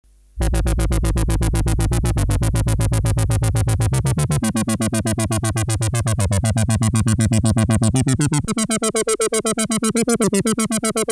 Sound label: synth